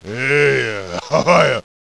yeah hoh; monkey isle3

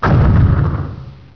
battle

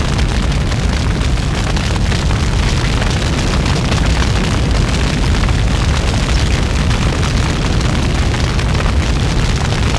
burning, ambience

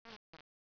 woot

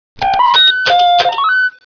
Key Seq

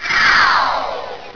dissolve

